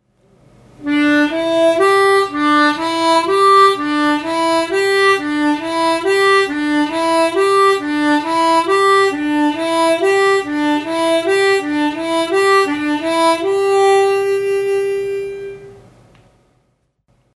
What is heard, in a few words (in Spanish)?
subida country, armonica